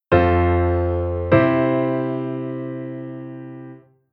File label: Chord Cadence